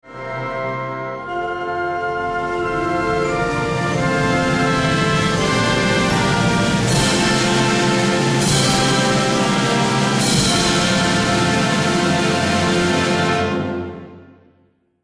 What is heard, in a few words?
win jingle; Russia